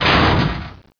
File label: DEBRIS